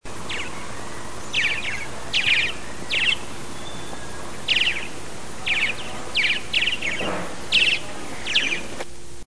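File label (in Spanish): Golondrina Doméstica, Progne chalybea, Grey- breasted Martin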